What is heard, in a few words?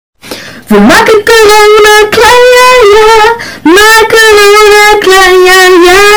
corona rap